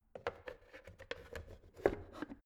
plastic water boiler lid on soft; maschinen; wasserkocher; soundpool

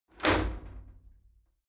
shower slide door open hit heavy

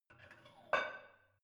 barbell light plate put on hit soft; sport freizeit; fitnessstudio

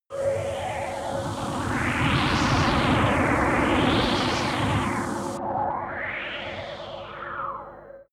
scout shuttle, raumschiff

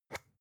spice jar scratch tonal open fast; industrie wirtschaft; gastronomie

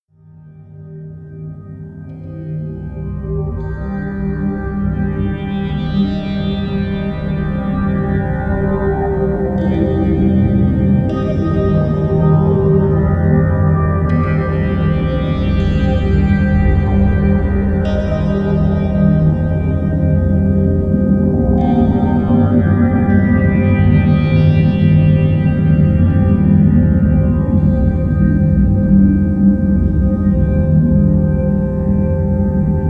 drone spanish

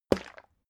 bottle syrup upend glug

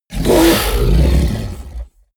fishman vocal snarl; kreaturen

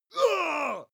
male attack shout; schreie; menschen

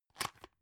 drug open; arzt

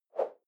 whoosh simple short fast

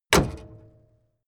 car camaro close hood

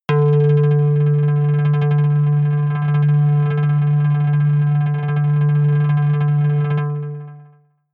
synth bass rattle tremble high, drones

